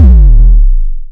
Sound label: sub bass thingy; WAR